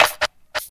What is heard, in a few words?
verby clap single shot d sharp key; single hits one shots